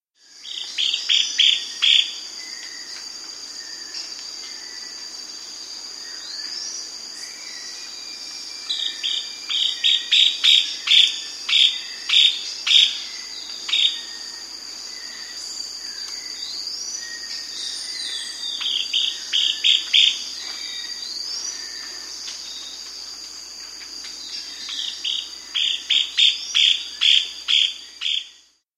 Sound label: Chestnut-Crowned Gnateater, Nature's Songs, S. America